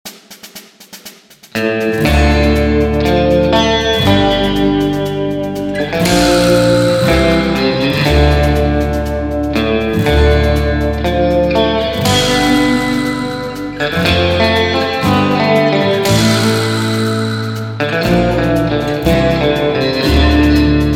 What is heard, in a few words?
Western Music